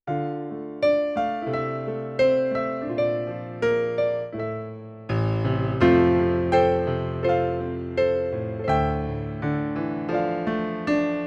accompaniment

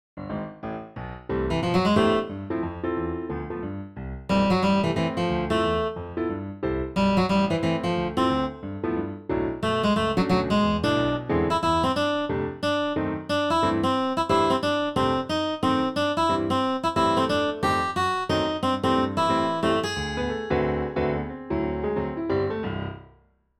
BASS accomp melody

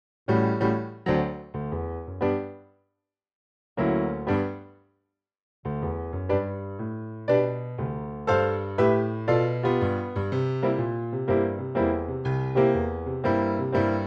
backing

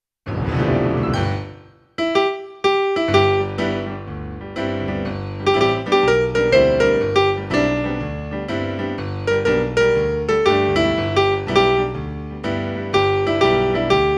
melody

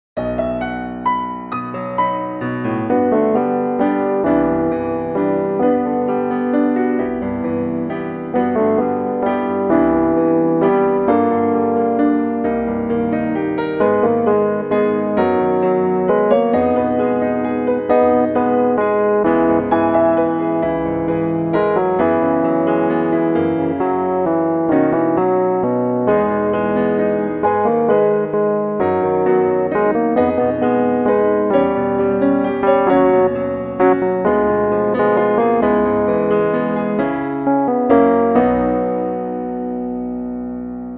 accompaniment with melody

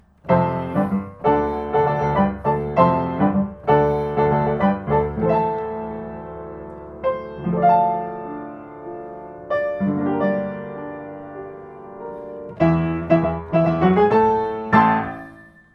accompaniment

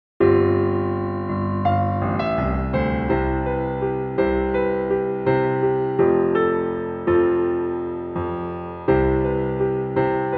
accomp

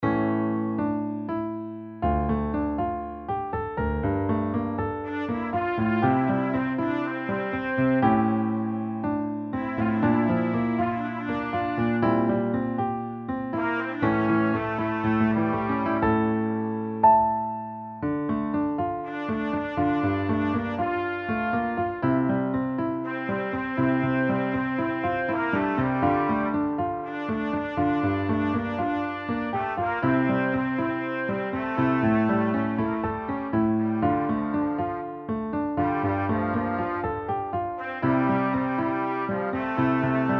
piano melody

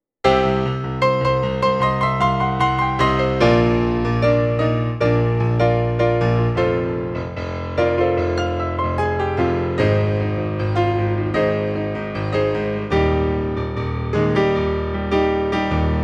accompaniment

